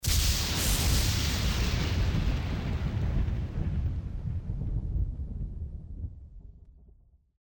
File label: Thunder loud; zps; Ambient